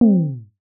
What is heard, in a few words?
soft cartoon bonk; reaction